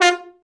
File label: BRA TROMB, Trombone; Sound & Vision - Gigapack II CD 1